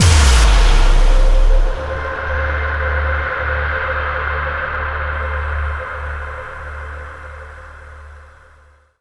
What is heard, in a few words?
Tonal FX C